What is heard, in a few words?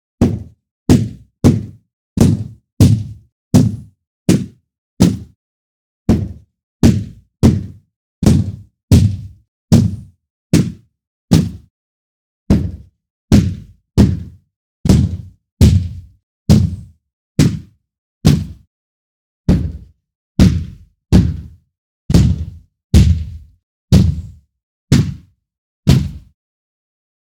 Punches And Slaps Variations; Robinhood76